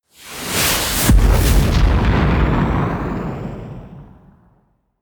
missile medium fire stationary close

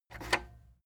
cassette eject release

